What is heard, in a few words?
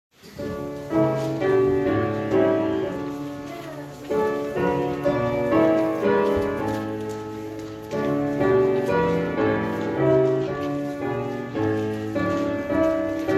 Gathering Hymn